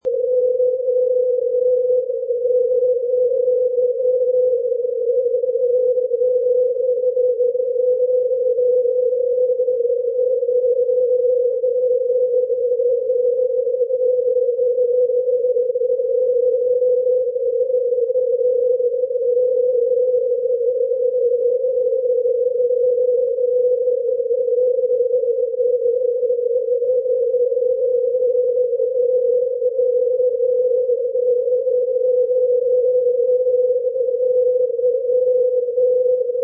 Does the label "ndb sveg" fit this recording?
Yes